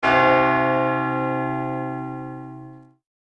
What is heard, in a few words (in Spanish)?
campana iglesia